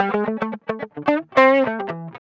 GUI, LICKS; 110 LICKS

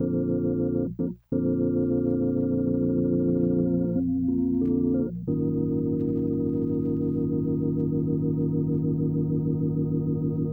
ORG, Hip Hop and R&B; Best Service ProSamples vol.01; AKAI

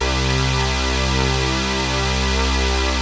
POLY SYNTH; Wizoo - Powered Wave

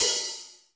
GATE HAT; Crypton - DrumBase5000; GATE KITS

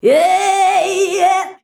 "yeaeayeah", shouts; Best Service; Hyper Dance

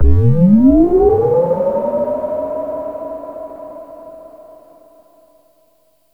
SYNTH FX

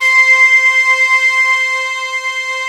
ARM BRASS C2, Synth; US ARMY

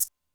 Maracas, Alesis D4 drum machine

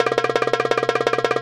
Tabla Roll; Roland BK-5; Percussion

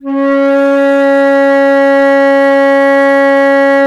FLT, WOODWINDS; Roland - LA Composer vol. 1

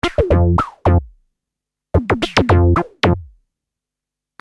Syn Bass 110 F, Techno Synth Loops; Sonic Foundry (Sony Creative Software); Signals I

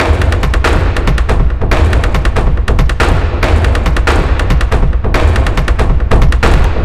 Orchestral Drumloop; M8; percussion and foley

